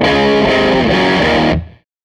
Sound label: Tele Guitar Loop